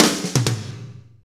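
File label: slow shuffle, Roland drum beats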